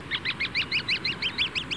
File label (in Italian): piovanello tridattilo